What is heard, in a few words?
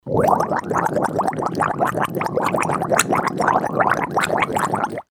gargling